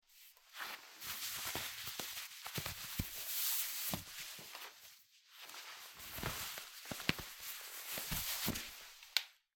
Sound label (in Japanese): 靴下を脱ぐ音